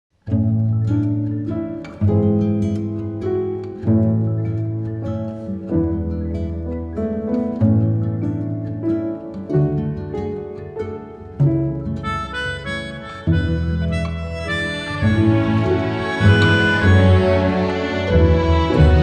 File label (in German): chanson nur musik midi; rendevous